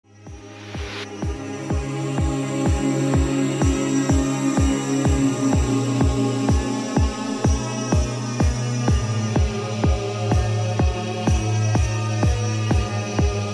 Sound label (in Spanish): Trance Electronica